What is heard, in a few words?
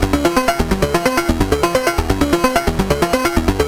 Juno-60 Arp, 130BPM